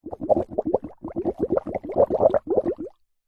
nature water bubbles bubbling in foamy soapy water